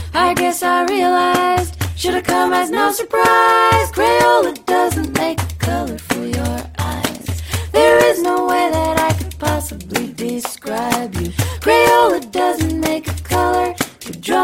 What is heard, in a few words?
crayon song